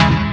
Synths C#; Vengeance Samples

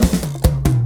Fill 140 BPM

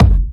Hard Kicks; Vengeance Samples

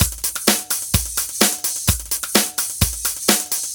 House Beat, 128 BPM

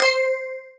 Synths C; Vengeance Samples

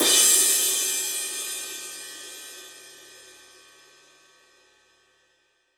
Cymbals crash; Vengeance Samples

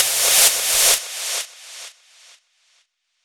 FX White Noiz; Vocal-Hook Kits; 128 BPM; Rap

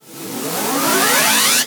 VFX Short Fills & Reverse

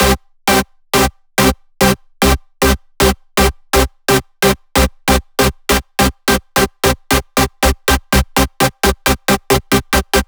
128BPM Accerlator Chord C, Melody Fills; 16Bars; Vengeance Samples

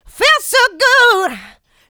voc, female vox, Vocal House

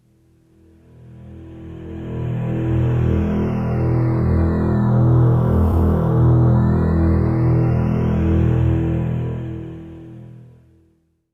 Trance Sweeps; Vengeance Samples; VEC2 FX

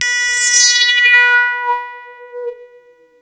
Arp E B4, ARP Odyssey; musicradar-synth-samples

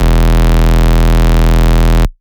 Bass Cuts; Vengeance Samples; VES1 Instruments

